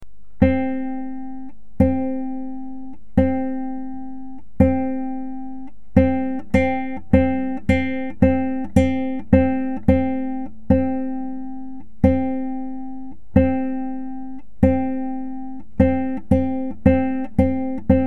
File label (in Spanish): negra corchea, guitarra